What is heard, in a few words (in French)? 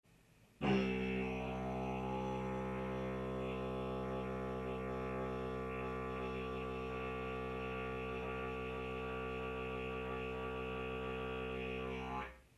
bourdon, le bourdon de base, didgeridoo; Premier pas: le bourdon de base